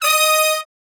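Hi Short Note (Eb) unison, Horncraft for R&B Sections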